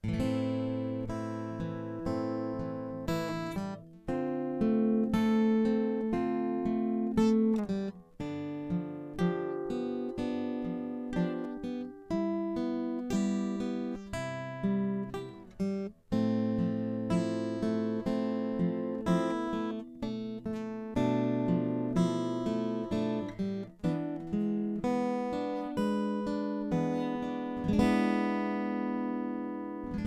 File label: ac guitar Amp